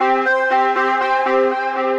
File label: Sapphire Bounce, Synth Rhythmic